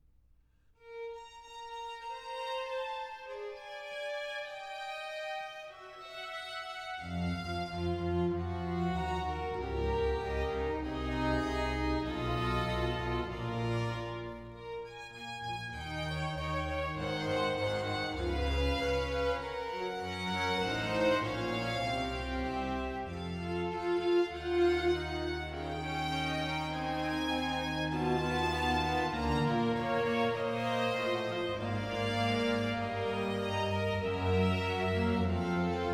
Vivaldi Sinfonia For Strings And Continuo In B Minor; RV169